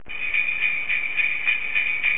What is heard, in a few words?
sleigh bells